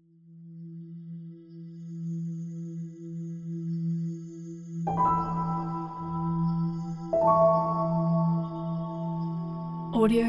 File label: emotional ambient soundscape